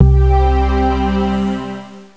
Song Win; Girls Only; NDS

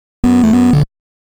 One Up

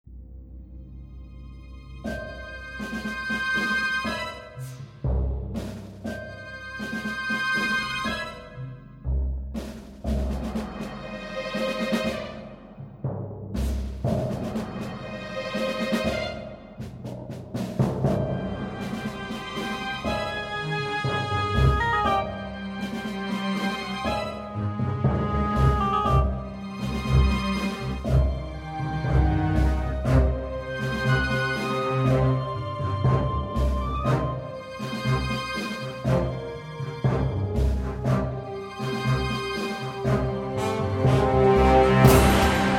field ambulance music; painkiller overdose

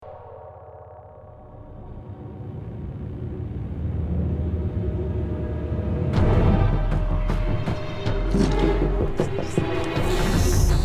music Combat; nithon

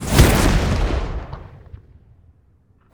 Missile Land; Survival

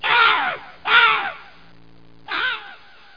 CROW; legend of wolf windows 1997

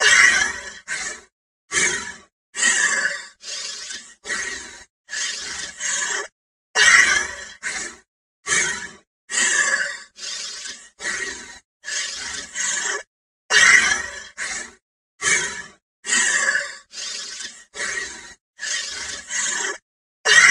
Beat Hi; GAUGE

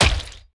Warrior Damage; we are the dwarves gamerip 2016

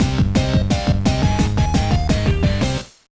Jingle, gba; Backyard Baseball 2007

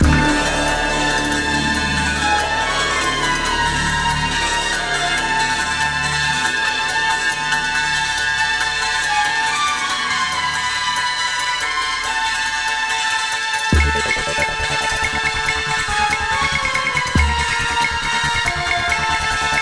Boss Fight; Tintin Destination Adventure

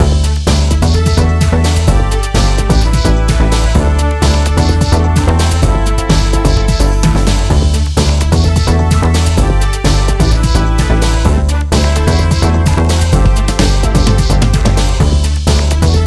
Result lose

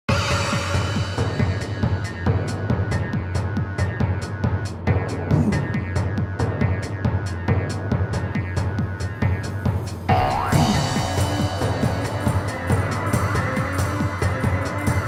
Battle Music; tokobot plus mysteries of the karakuri; playstation 2

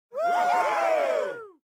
Army Joy; Voices Vo Fight; Mighty Party; Android

